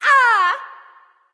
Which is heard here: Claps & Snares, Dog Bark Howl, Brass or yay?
yay